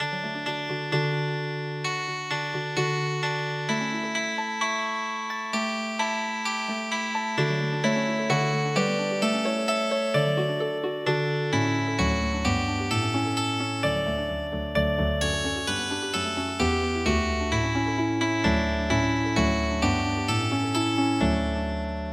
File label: Healing Harp; midi; traditional